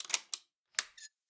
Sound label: Lockbox Unlock; The Town